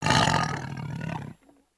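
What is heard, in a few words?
Sabertooth Idle; creatures; pets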